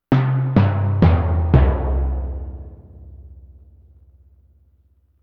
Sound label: timpani short